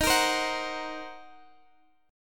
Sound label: Eb Minor 6th, guitar chord; Ebm6 strummed